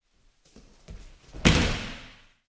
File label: Judo throw on dojo flooring